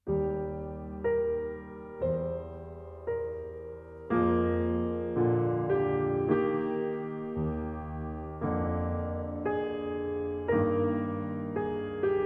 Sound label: string quartet in b flat major cavatine adagio molto espressivo